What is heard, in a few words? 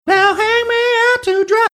singing